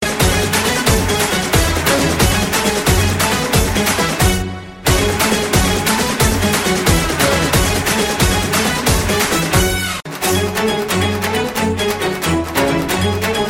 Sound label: instrumental; bollywood